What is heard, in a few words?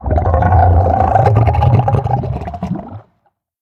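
Liopleurodon sound; Lioplurodon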